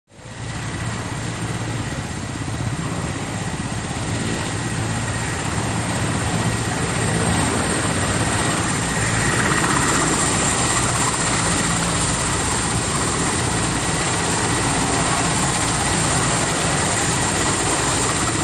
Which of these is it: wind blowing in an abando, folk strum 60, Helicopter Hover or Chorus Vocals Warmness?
Helicopter Hover